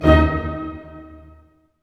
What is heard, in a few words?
HIT STACO, Staccato Oct; String Master Series; Dynamic Orch